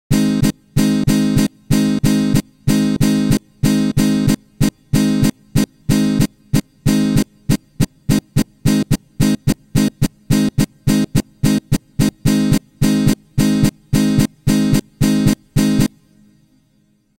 Ovation SOLO A Dur

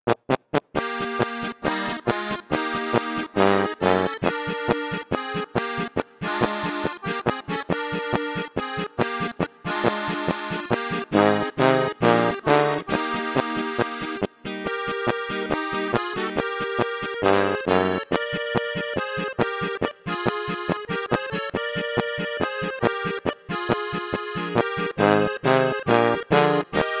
Weber Bariton normal

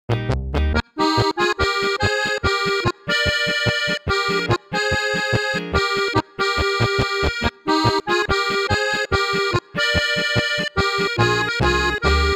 Steirische 2 chörig II

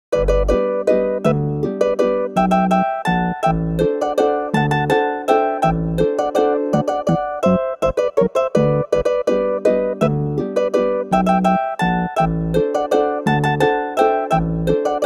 Harfe Diskant Harfe Bass Harfe Akkorde ausklingend

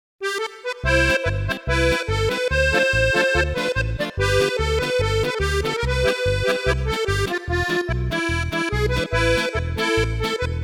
Celeste E Bass mittel; PIGALLE; Erman

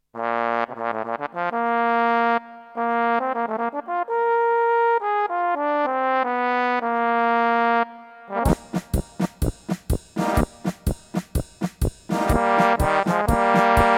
Zugposaune Diskant Akkorde B Tuba Schlagzeug

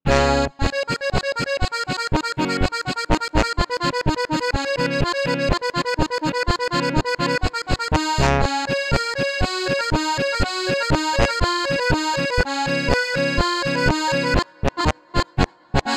HOHNER Morino VM 3 chöriges Tremolo

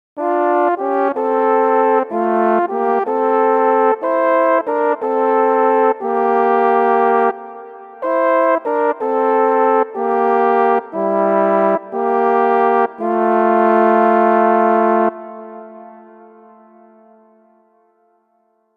Tenorhorn normal Solo

